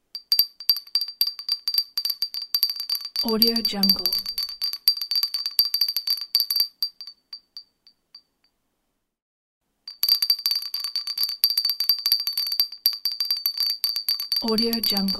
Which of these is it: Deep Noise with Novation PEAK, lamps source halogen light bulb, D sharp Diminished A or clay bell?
clay bell